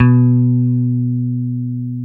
DAN OPEN B3, BASS

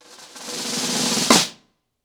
Snare FX 90BPM, ACOUSTIC DRUMS; Sonokinetic - The Roots Drumloops

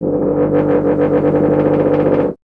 BRS TUBA, Solo Instruments

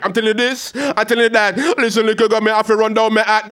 HAL RAGGAM, RAGGA-MUFF A maj

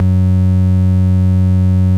bass, Dance Mega Hip Hop Electro; EastWest

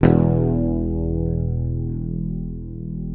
PICKED, Bass, E1; Sampleheads; Will Lee Bass Library

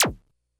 Bleep A, Drum Machines, Korg DS-10

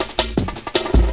LOOP SD, Drum & Bass, 160 BPM; Ueberschall; Drum & Bass Resonance

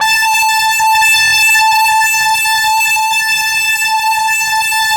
FLYER A4 R, Yamaha VP-1; Music Store; 90's Sample CDs

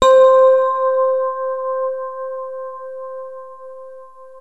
Access Virus B; Synthworx

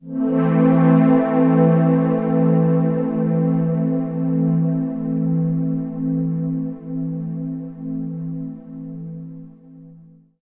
PAD L; Best Service Advanced Media Trax Building Blocks; SIERRA

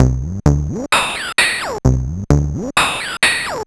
Sh101 Loop, 130BPM

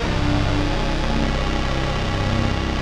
basses; Wizoo - Powered Wave

